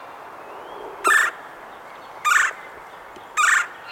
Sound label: red bellied woodpecker